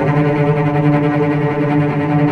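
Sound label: cello tremolo D3 mezzo forte left, string; East West - The Ultimate String Collection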